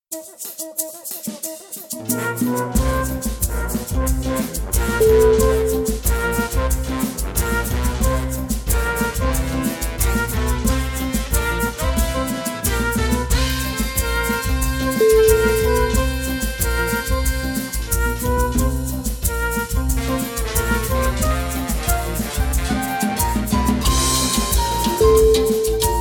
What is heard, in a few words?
samba yamba